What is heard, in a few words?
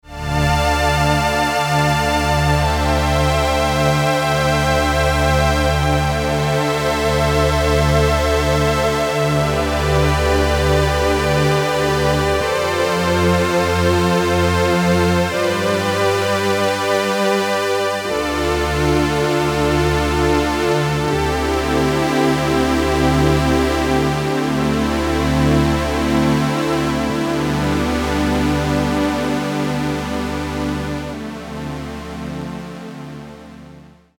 Strings